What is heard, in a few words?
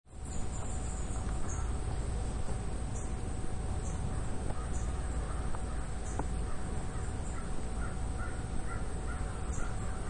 Outdoor General Ambience, Quiet countryside ambience; Quiet countryside ambience from rural upstate New York. Few birds and insects. Seamlessly looping; Lynne Music